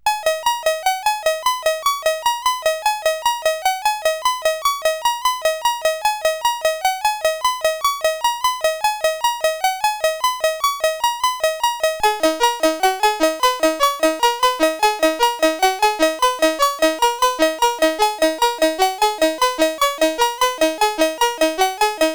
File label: Soundwave Tubular Bells